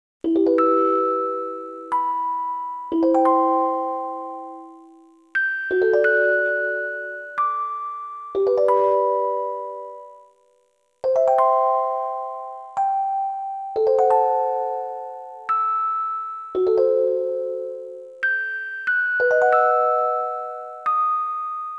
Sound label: Music Box; Mystic Ark; Only a Music Box Remains